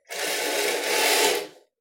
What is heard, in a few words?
Slide Curtain; Household Sounds / Doors, Windows & Locks